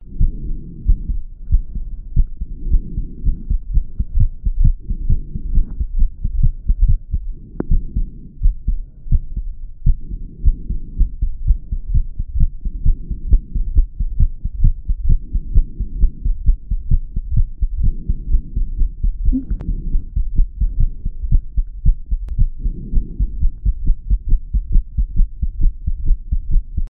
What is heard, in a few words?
heartbeat heart fluttering 2 minutes after exercise